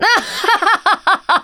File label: Emote Laugh; the princess and the frog; Twitch Skijump; Geo